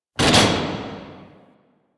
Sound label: Ship BFG Mech, Vehicles, Weapons; Warframe; DE; RailJack